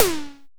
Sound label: TOM, ANA TOMS; Drums In Your FACE!